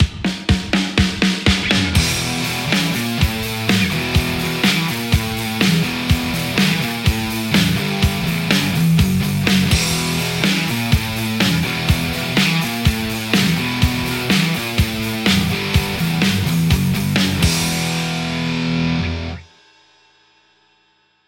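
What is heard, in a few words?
Telecaster; rcvprod amplistortion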